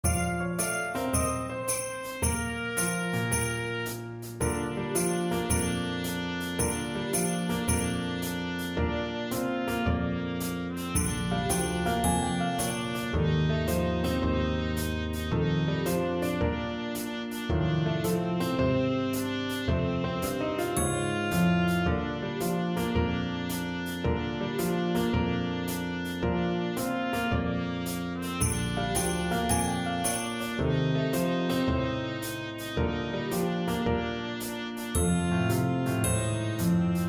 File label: Trumpet Track with Melody; Jingle Bells